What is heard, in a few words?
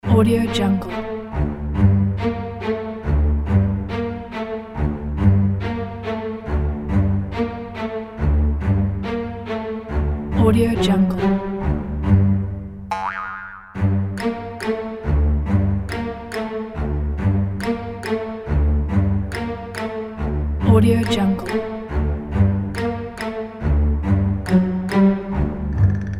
strange santa